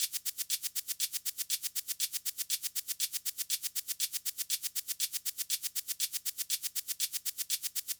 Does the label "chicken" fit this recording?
No